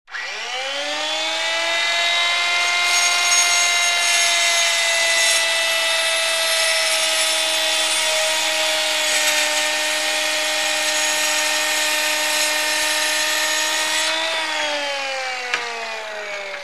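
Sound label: Skill Hand Saw Cutting; air tool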